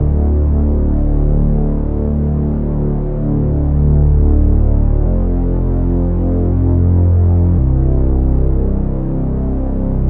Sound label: Synth Loop Dm 95BPM